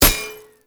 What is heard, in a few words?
Drone Hit Notification; Enemies; Abilities; Warframe; Tau; Dax Medic